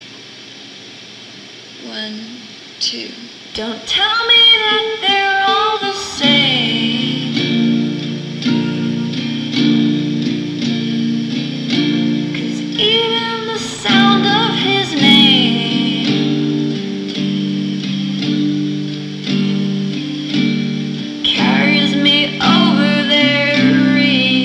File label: Korean Rock; Native Korean Rock